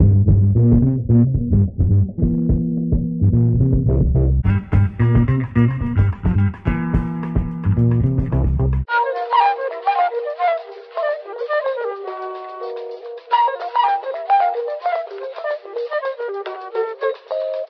flute, Loops Phonky

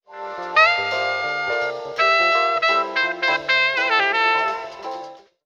sax not unified, loops, phonky

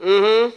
mmm hmm; ooh ahh; jerky boys

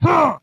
"huh", male; Voice; ooh ahh

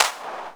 cancerous, Clap n Snap, Snares

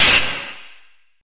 crash; Crash Bang Boom

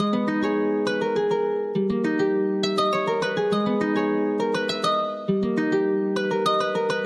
guitar beautiful, Jazzmatazz loops

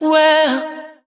well, SUNG; ooh ahh

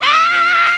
scream SUNG; ooh ahh

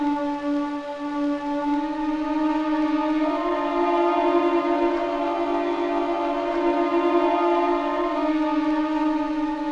strings tragedy; Loops; Sad

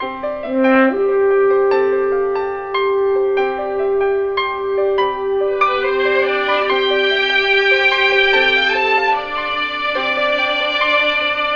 strings and piano; Loops; Phonky

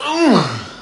"ooh", voice

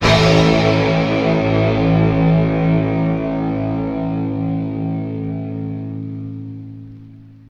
geetarrrrrr

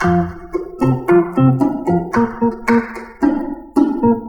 keys, Phonky loops

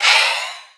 breath

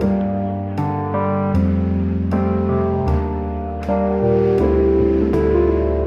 keys, Loops, Sad

